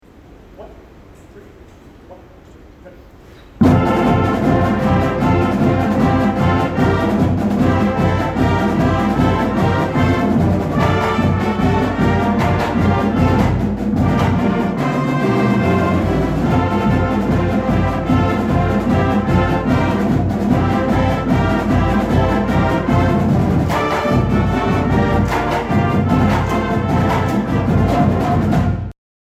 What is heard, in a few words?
Fight Song Music